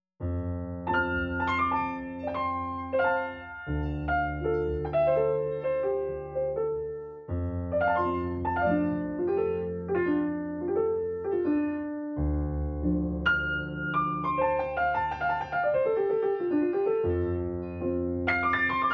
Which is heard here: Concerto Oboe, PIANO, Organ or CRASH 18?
PIANO